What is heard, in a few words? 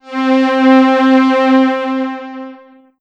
Ensoniq ESQ-1 String Ensemble C4